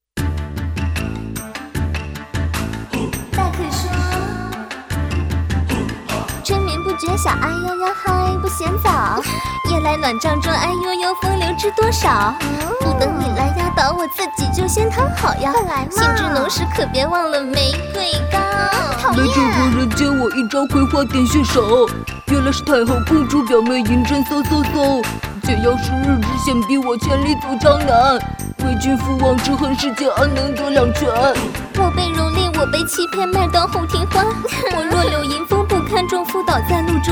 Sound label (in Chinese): 女声配音 歌曲类; 国语女声配音